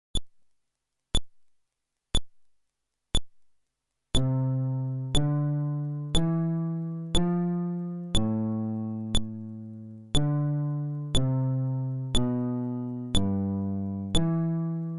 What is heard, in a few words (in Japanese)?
フレットレス